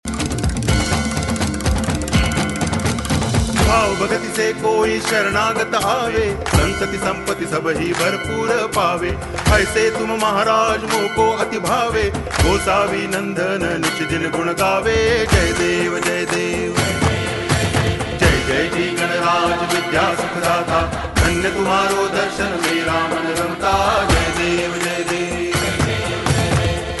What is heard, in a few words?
ganesh mantra; Navratri Special